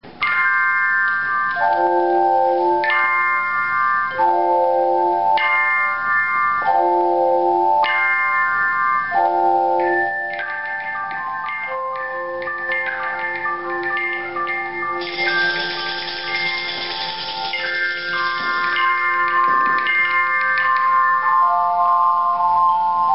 Pentecost Peal

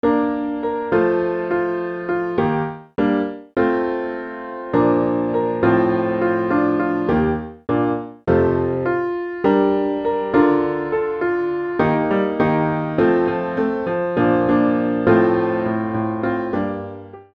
accomp; Salvation Alleluia

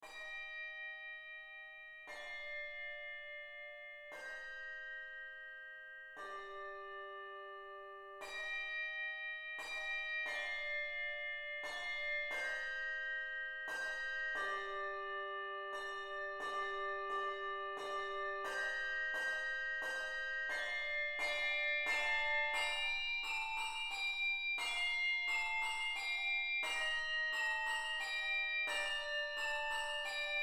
Christmas Chimes

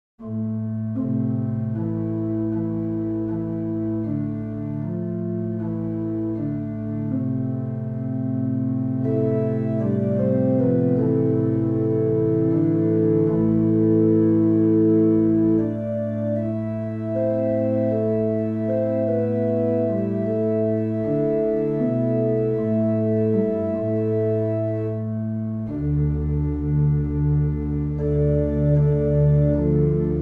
Meditation on Veni Emmanuel Organ

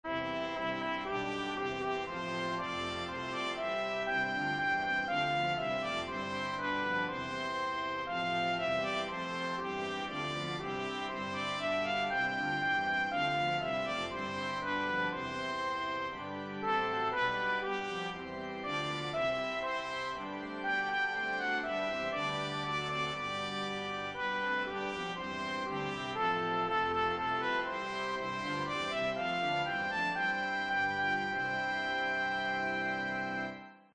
Jesus Christ Is Risen Today Trumpet descant

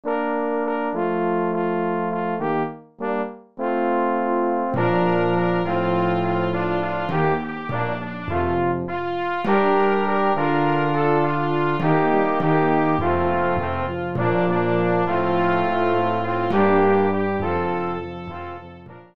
brass accomp; Salvation Alleluia

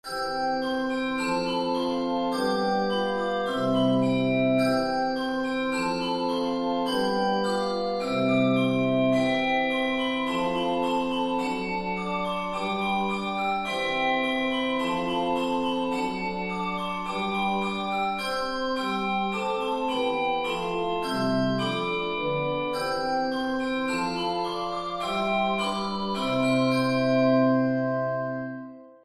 descant; I Am Jesus Little Lamb

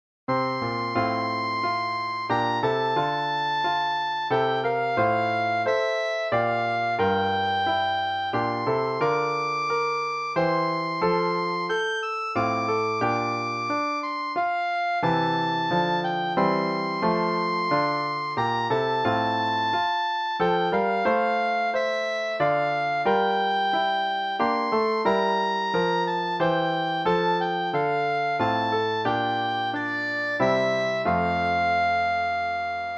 Clarinet Descant; Away in a Manger

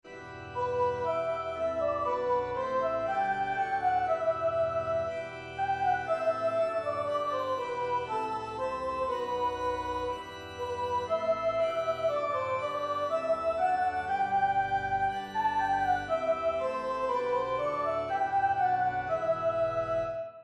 Lord Keep Us Steadfast Descant playback

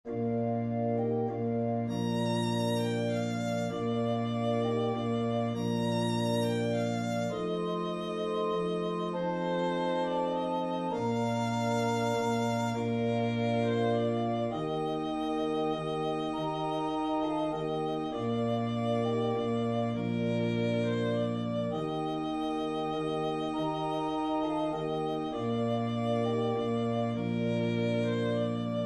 violin playback; Silent Night

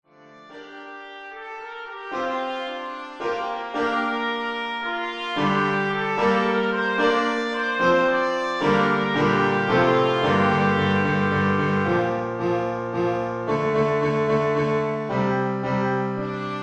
trumpet piano; St Patricks Breastplate

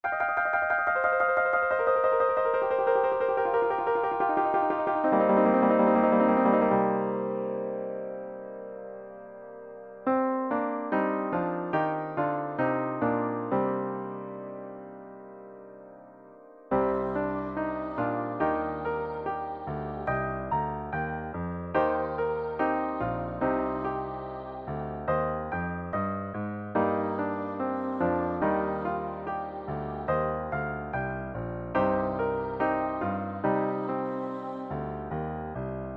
piano

